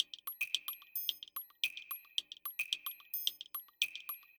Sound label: bird pickup; toki tori collection